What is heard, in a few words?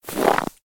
Snow Footstep; gamerip; I Am Weapon: Revival